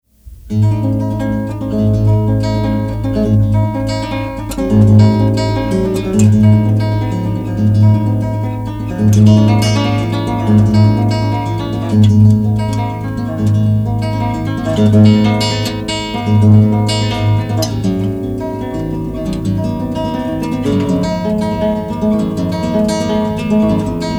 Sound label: plays Etude, guitar; Brouwer; Etude 6